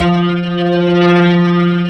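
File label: Slow Fast Hammond, Organs; E-MU Producer Series Vol. 5 – 3-D Audio Collection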